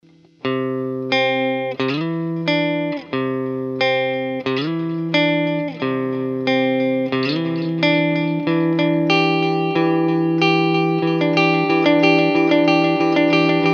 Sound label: Dynamic delay